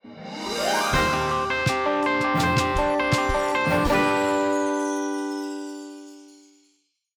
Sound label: fanfare; Smooth Guitar Fanfare; Owlish Media